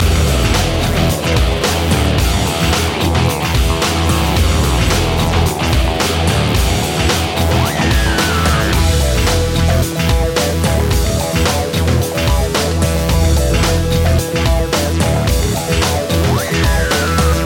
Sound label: beat loop